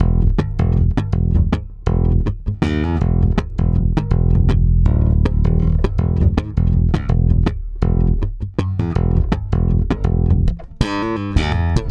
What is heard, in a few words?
AL STRUM F#, bass; Spectrasonics; Bass Legends; 88 STRUMMIN